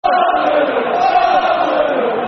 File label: Slow Tempo Football Chant (Soccer Song); Arsenal